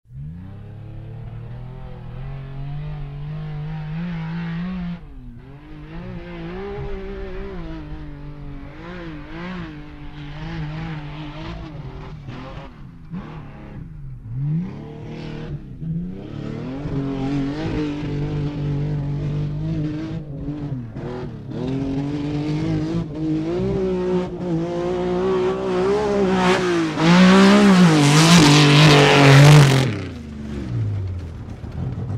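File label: approaching, arrival and departure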